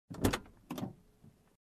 Car interior compartment opening

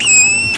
Kitten squeaky meow

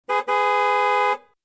Car Honking

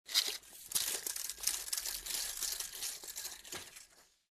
Construction

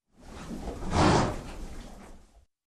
Horse Bray

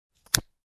Lighter switched on

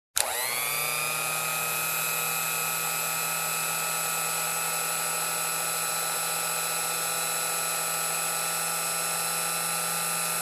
Electric Hand Mixer Level 2

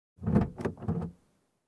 Unlocking car from inside

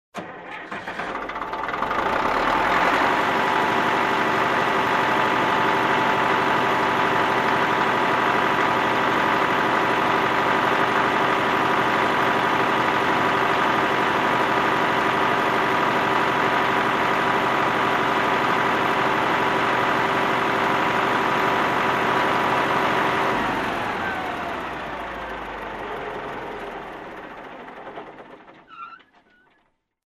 Truck Engine Start and Off

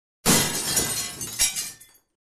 Window break

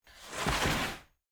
Sliding sound

